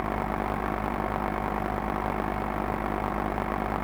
PROPELLER ENGINE Loop mono; adams venture origins

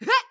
Boost; Valkyrie

